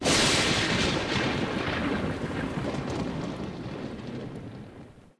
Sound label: thunder, environment ambient